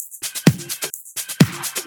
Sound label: House loops 128BPM; Vengeance Samples